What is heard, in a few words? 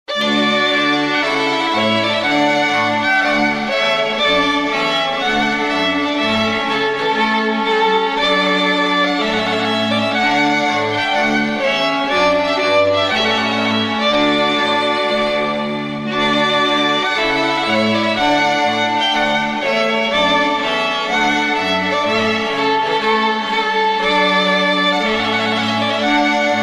Trumpet Voluntary, string quartet; Clarke